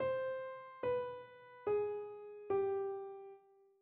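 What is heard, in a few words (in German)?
Tetrachord Span1 c ab, spanisch; Tetrachorde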